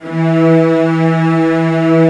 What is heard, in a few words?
CELLOS F#3, Strings; ILIO; Synclavier Strings